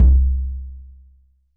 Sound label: KICKS, DRUMS; Music Store Sound Service; Einstein